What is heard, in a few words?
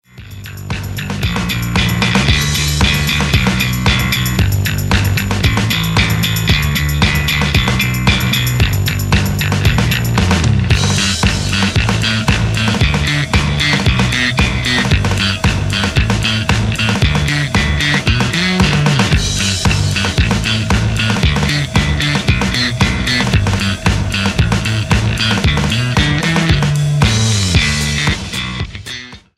bass